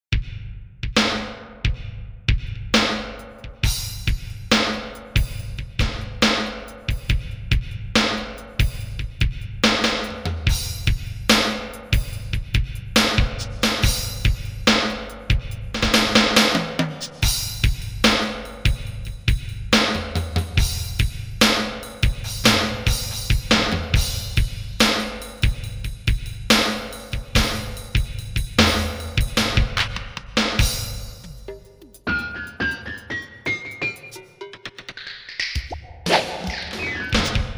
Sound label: DRUM LIVE, Korg MSC-1S; Korg MSC-1S Variety